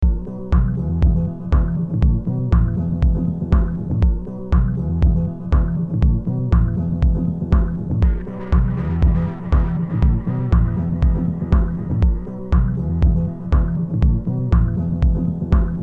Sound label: guitar